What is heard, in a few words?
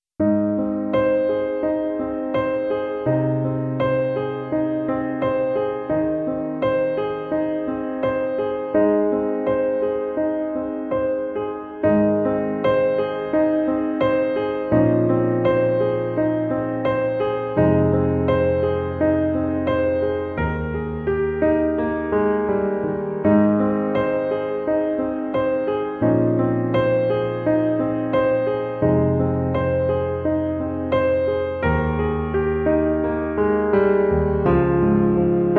Floating Piano Only